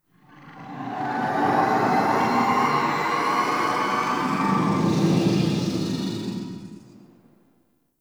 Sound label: Inhale Interior Small, Ambience; Warframe; Sentient Lair